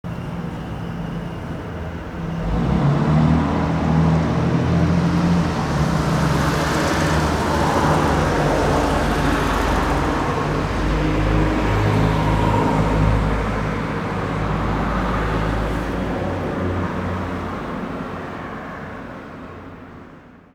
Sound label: city noise intersection, street traffic; best service Blue Box